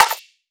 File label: snare foley